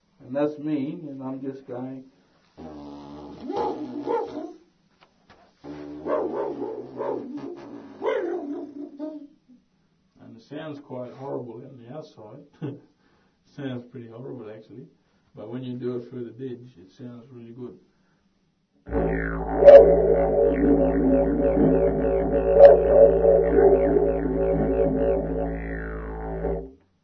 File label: Didgeridoo teach